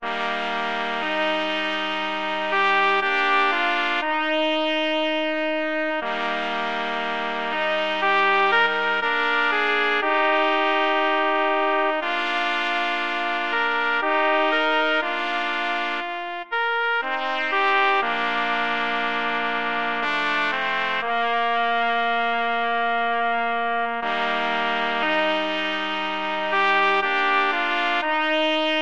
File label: clarinet concerto trumpet trio